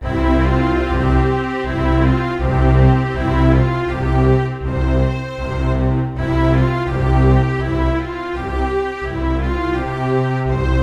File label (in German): Happy Start Orchester Jingle B